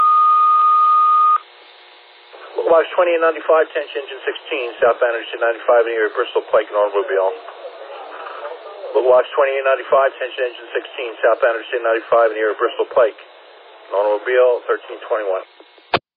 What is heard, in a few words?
alert page received